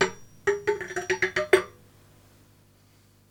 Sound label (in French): Rebond corde de jmebe